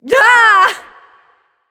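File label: yay, female; smite; voicepack; hera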